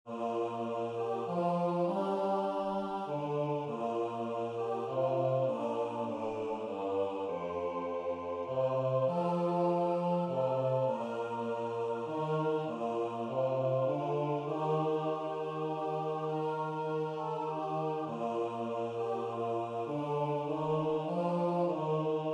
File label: bas; Glade jul; korsats